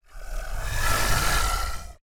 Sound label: Generic Creature Growl Alligator Like